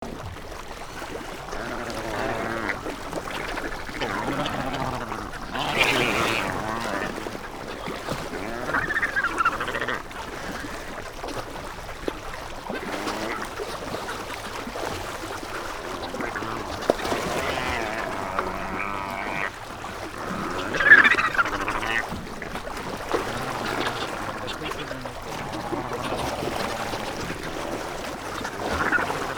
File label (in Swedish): VAndringsalbatross